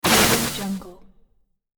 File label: scifi object hit; sonic-boom